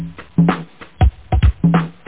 drums

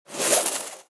make mats; Immortal

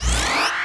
Pulse Laser Charge; Warzone

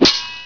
Sword; Prince of Persia 2 Demo

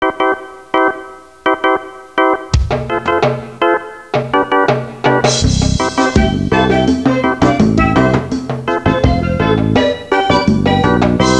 REGGAE

